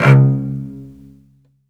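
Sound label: SOLO cello, Strings; ILIO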